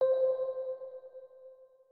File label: organ